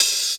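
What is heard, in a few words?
Closed Hi-Hat, Dance Drums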